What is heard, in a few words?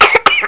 BABY COUGH; Amas II v1.1